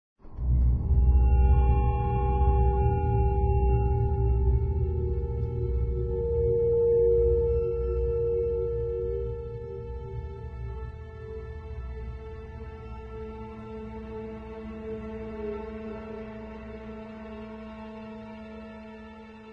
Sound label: station, Ambience